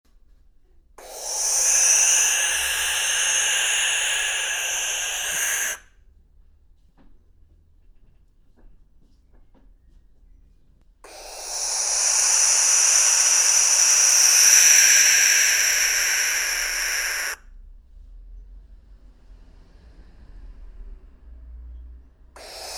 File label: Barn Owl Sound